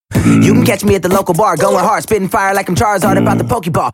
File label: Futuristic Spitfire